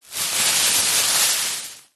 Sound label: foley plastic bag thin empty movement rustle handling short